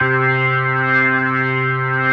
C3S, B3

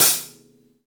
V-Pop Closed Hi Hat, Roland BK-5

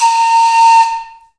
AF FLUTES, Heart of Africa; Spectrasonics; Heart of Africa 1

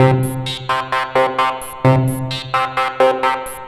MODUORG C, Modular Synth Loops; Polyend; Simulacrum Sounds

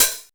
909 OPEN HH; TR808+909

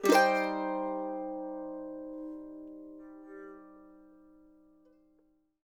mando min; Sampleheads; Whole Lotta Country 1 & 2

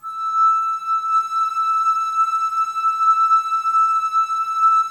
Flute, Woodwind

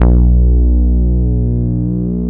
ESQ 1 MOOG, Synthesizers; Soli-Music; World of Synthesizers VOL-1

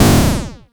ZAP DRUM, MACHINE PERC; Zero-G Ambient 1

